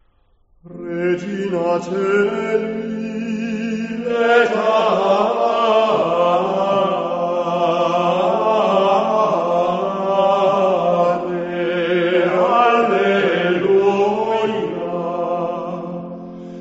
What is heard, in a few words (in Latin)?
Regina Caeli solemne; PASCUA; Kyriale I Tiempo de Pascua; Bellarmina